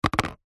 household plastic disposable cup drop; zapsplat

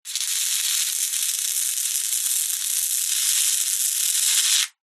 industrial spark electricity surge

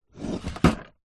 office stationery drawer wooden close

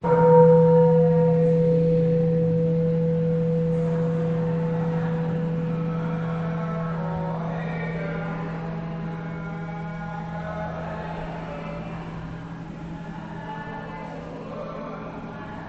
Inside A Caodaism Church Bell Prayer vietnam

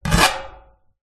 metal scrape resonate garage door; foley

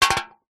drink soda can empty drop knock over wood deck; zapsplat

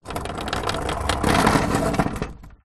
household gate driveway wooden roll open or close; zapsplat

